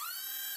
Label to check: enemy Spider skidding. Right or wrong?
Right